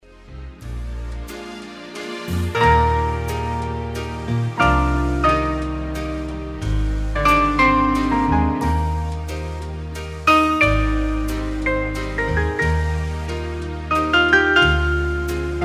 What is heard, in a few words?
piano